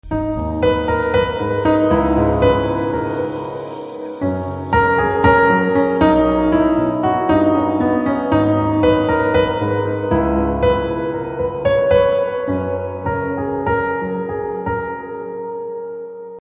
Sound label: Love BGM Ringtone; Radhe Shyam